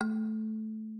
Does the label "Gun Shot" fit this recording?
No